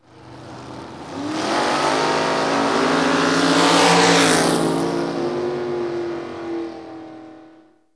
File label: RACE CAR; trasporti; auto